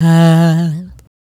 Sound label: "Hah" E2, Swingin' Single Notes